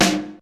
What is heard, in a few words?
SNR; Hollywood Edge; The Big Bad Beatmachine; XR-10